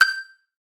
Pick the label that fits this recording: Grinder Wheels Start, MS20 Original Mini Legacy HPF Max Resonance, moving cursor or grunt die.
moving cursor